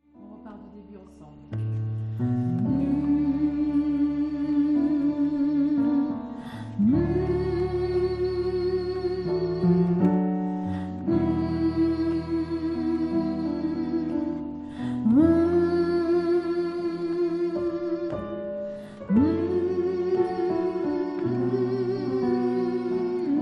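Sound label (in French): MEZZO UNISSON, gospel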